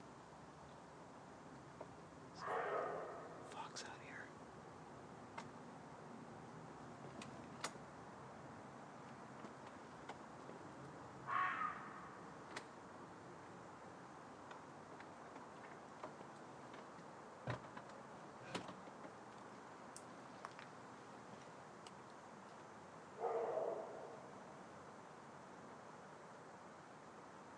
fox crying behind the house on a warm winter night